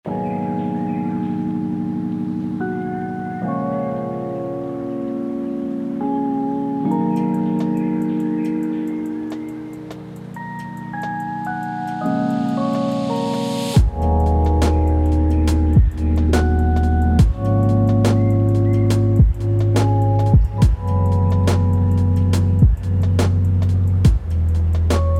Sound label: Instrumentals